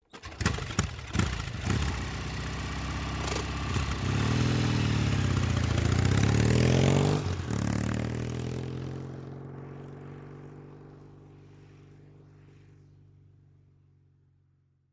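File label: Motorcycle Norton Kick Start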